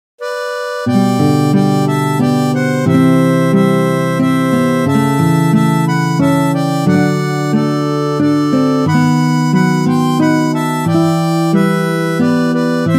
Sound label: Harmonica; We Wish You A Merry Christmas